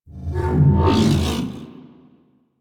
UI Augment Reality Stop; DE; Warframe; Railjack Player Ship

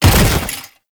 Body Fall Light Default; Enemies; Warframe; Tau Dax

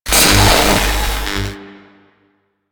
Void Dragon ring sparks; Enemies; Warframe; Duviri

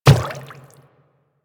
Quadruped Medium Walk Water, footsteps; Warframe; Infested

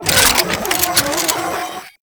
Reload A, Infested Arc Rifle; Warframe; Weapons; Coda Synapse

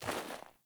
Sandals Walk Snow; Characters; Player

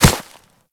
Kubrow Step Snow, Footsteps; Enemies; Warframe

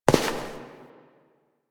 Step; Warframe; Koumei

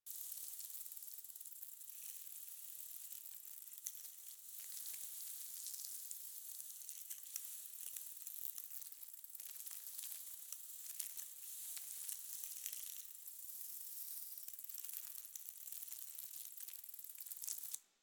Exterior Wind Debris Insect Loop; Warframe; Infested Eris; Ambience

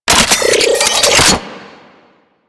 Archwing Mach Gun Equip, Weapons; Warframe

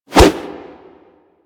Brawl Whoosh B; Warframe; Brawling

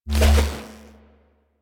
Fishing Spear Charge; Warframe; Venus

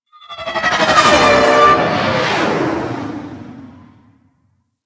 Fighter Ship Attack Maneuver, Engines; Warframe; DE; Vehicles; RailJack; RailJackEnemyShips; Grineer; EnginesGeneric; Maneuvers

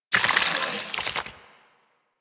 Draw B; Warframe; Weapons; Infested; Inf Cernos; Prime Derelict Cernos

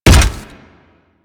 Step; Warframe; Corpus; Arachnoid Wraith; Steps

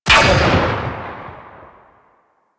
Gunblade Automatic Shot Release, Weapons

